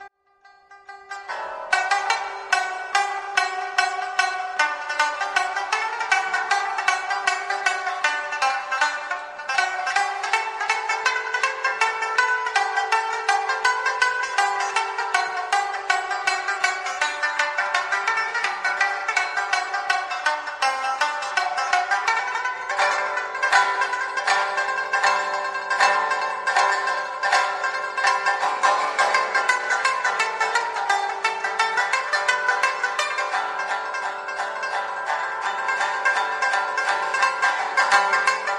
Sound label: Rawap Music